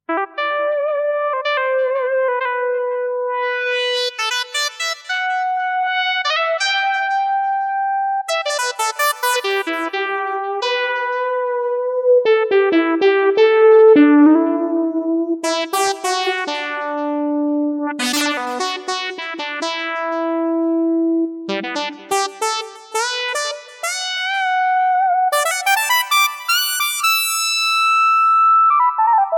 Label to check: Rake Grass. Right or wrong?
Wrong